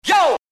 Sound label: scratch